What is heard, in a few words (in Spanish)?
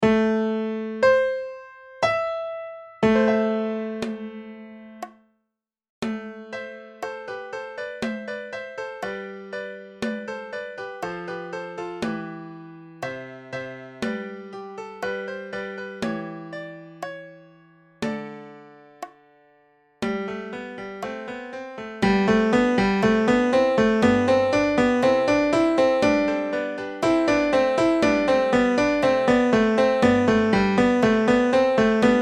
alto, midis; Con el fin de ayudar a preparar las obras del seminario